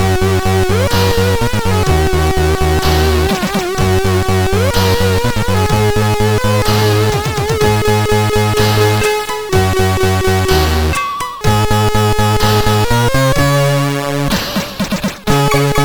Funny Music, MOS6581R4

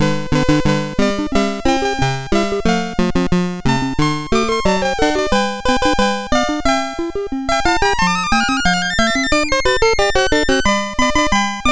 sid MOS6581R4, GAMES; Accolades Comics

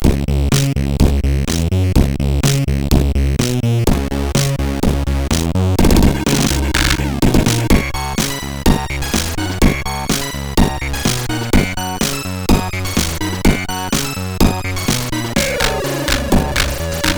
Music Mix, sid MOS6581R3; hvsc

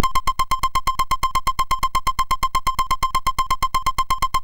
Shuffle, MOS6581R2

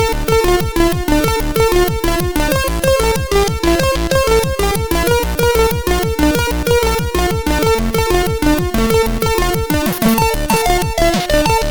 Plaster, MOS6581R3; hvsc

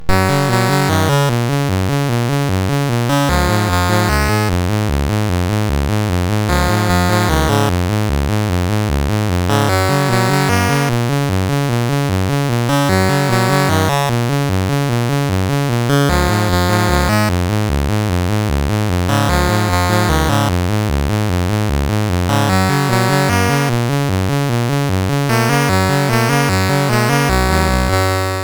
Sill i Dill, sid CSG8580R5

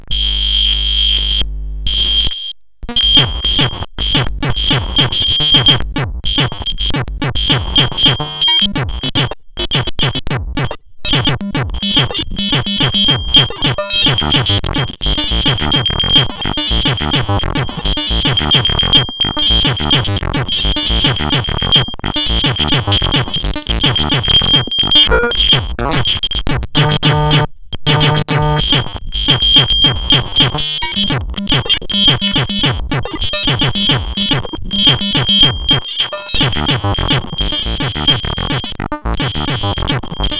sid CSG8580; Manham

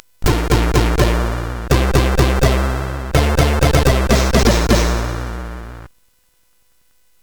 Jingle, sid CSG8580R5

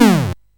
Dark Castle, CSG8580R5

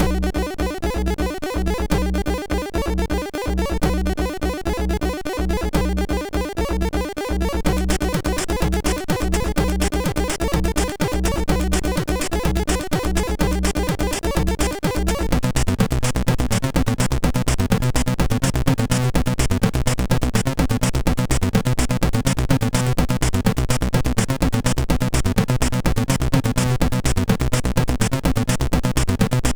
Major Techno Mix, sid MOS6581R4

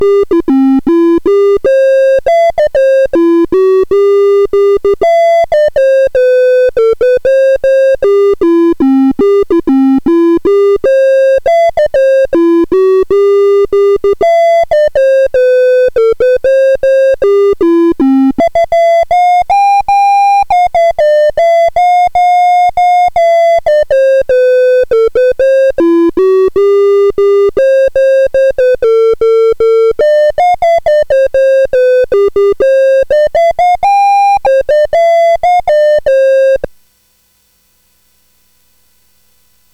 American Flag BASIC, CSG8580R5

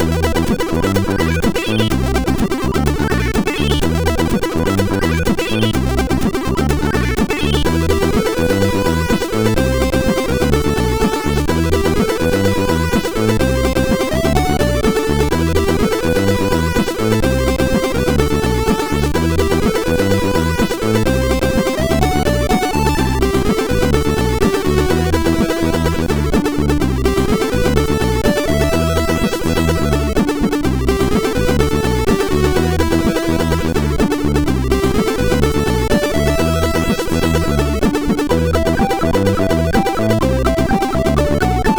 sid, MOS6581R4; Going Crazy

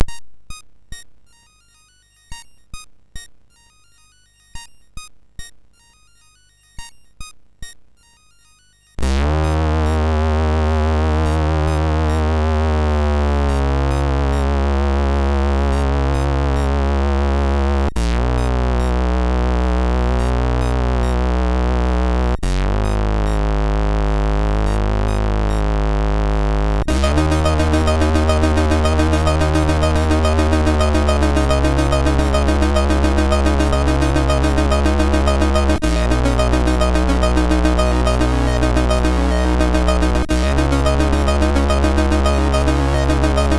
Rain, sid MOS6581R3; Morton Adam

